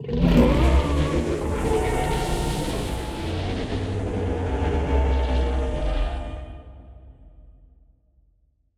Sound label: void worms mature death; Stellaris; grand archive; ships